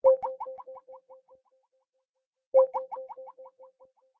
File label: call reconnect continuous; skype